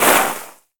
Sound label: step skid